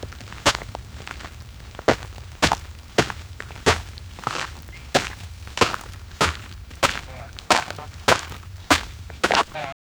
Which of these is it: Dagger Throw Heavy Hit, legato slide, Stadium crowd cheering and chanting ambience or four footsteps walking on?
four footsteps walking on